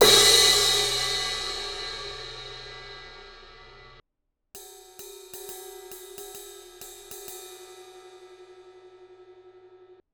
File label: 18 inch traditional jazz crash ride crash ride bell; Bosphorus